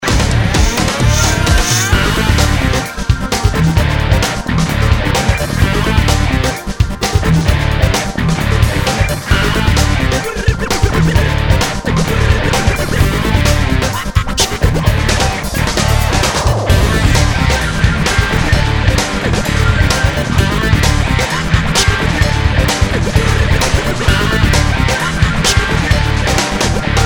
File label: riff